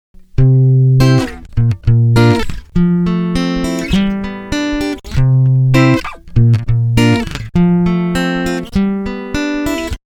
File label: ovation elite